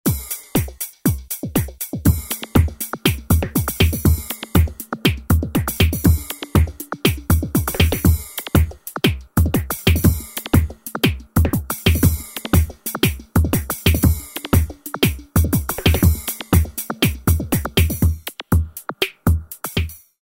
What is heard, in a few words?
Swing